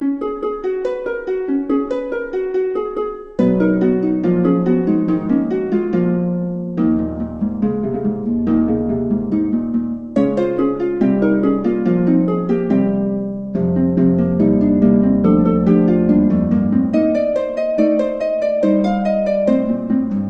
Harp

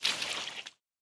deepwater footstep; adams venture origins

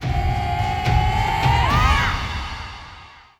Battle Transition To Tense; The Witcher 3 Wild Hunt; Toussaint; Dun Tynne

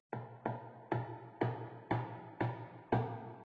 Amb Pigcity Hammerhit

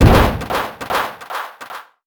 Metal Explosion

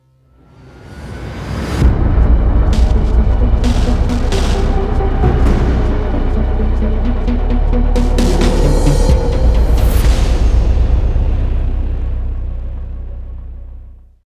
Multiplayer Start; modern combat 5 blackout; android; Ryogoku

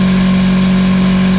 Low Nissan Z350; car simulator 2

